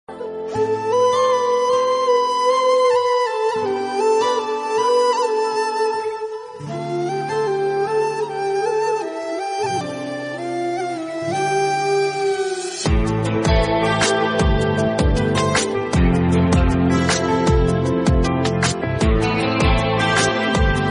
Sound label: instrumental ringtone; bollywood